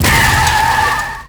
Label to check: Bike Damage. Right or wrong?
Right